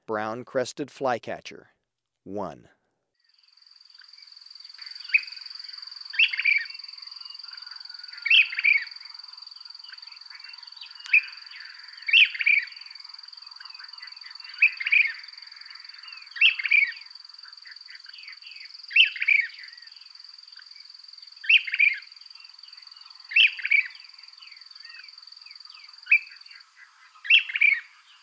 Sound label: Brown-Crested Flycatcher, Birds of Ecuador; S. America